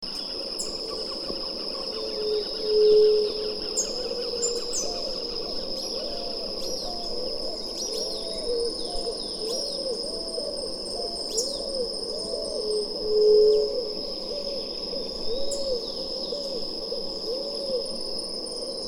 White tipped dove, قمری ورو

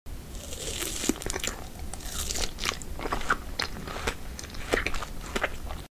Chewing fruit

